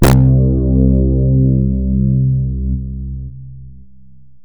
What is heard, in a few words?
Synths BASS